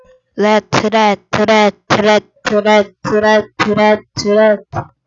rat

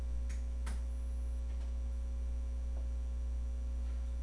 jungle

